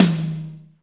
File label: tom Rock hi; drumkits; hydrogen; GMkit